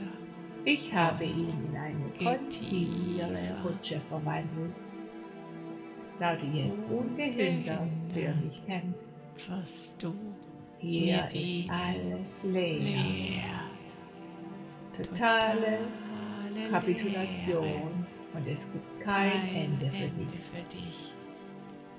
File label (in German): Binaurale Beats; Eliminierung deines Ichs